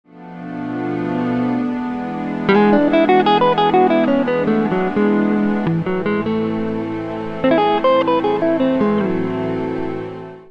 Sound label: melodic minor 2 5 1; fretjam